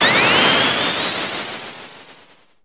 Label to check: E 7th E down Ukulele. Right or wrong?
Wrong